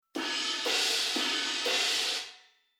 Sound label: Shuffle Crash, Rhythm